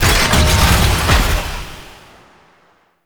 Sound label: Orbital Station Air Lock Open; Tau; Interior; Orokin